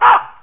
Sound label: Primate Bark